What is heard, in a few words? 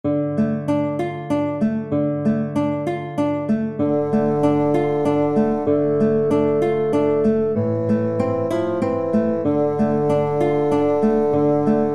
Scarborough Fair Bassoon